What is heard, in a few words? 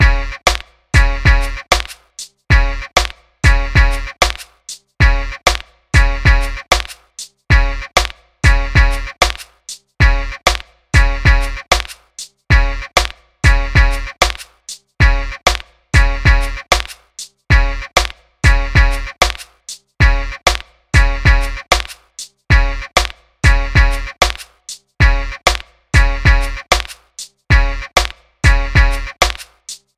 Hip Hop Drum Loop